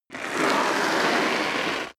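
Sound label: step dress boots concrete grit slide long, footsteps; schritte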